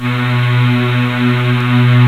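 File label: synclavier pad; Rubber Chicken Software Sample Bank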